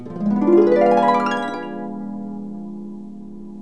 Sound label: HARP GLISS